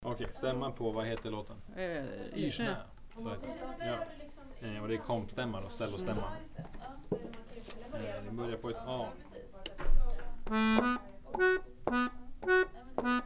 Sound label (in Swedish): Kompstämma